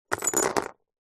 leisure board game tokens metal handful set down on playing board; monopoly